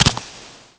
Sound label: land, FOOT; The Elder Scrolls III Morrowind GOTY; XBOX